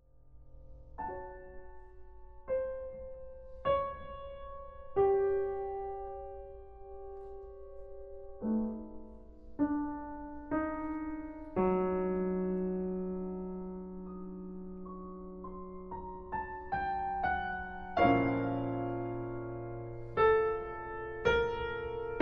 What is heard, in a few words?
Partita No. 4 for Clarinets and Piano I. Overture B flat Bass Clarinet